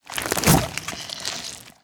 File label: Damned Deathcrack